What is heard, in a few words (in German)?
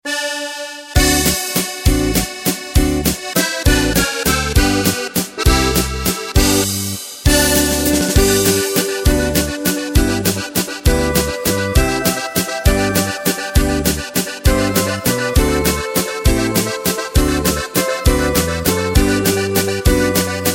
DRUM